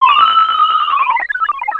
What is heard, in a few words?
robot idle; Earth and Beyond; EA Games